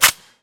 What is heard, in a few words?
m60 fire empty; mg; garrysmod